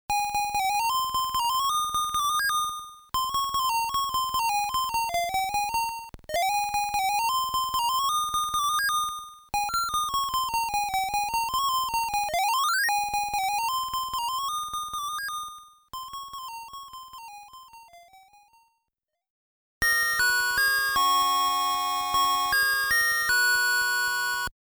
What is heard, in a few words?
Stage BGM ~ Bell; KANGAROO; Legend of Game Music 2 Platinum Box